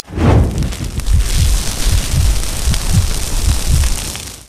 fuego

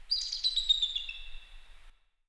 aguila, animales

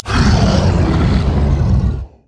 zarpazo inicio

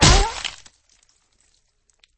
caida

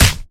pelea

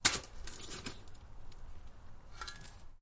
cavar

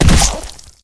caida

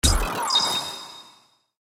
golpe redentor directo

